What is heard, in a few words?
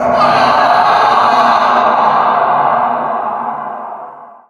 male scream